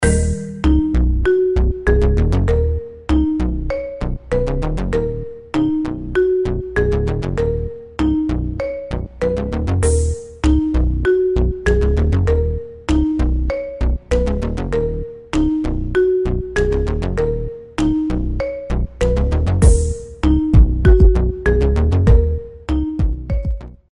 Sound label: iPhone Instrumental